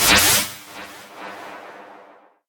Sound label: Electric Impulse On Wires